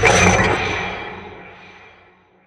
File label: dead water cast; tidehunter